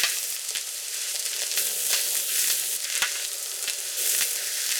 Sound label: Cracklature; sparse soundscape; Loops